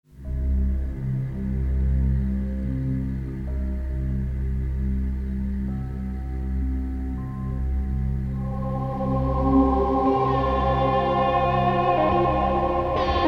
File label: Ambiences - Triangular Bells